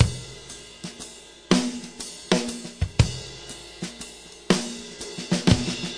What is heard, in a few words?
six eight loop, 100 to 105 bpm